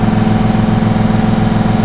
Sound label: MOTOR, Slot Car